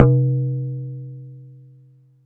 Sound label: Synth Bells; Dream Zone